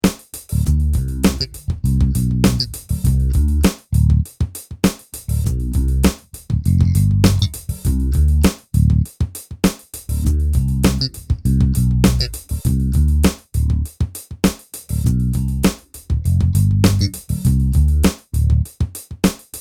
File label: Funk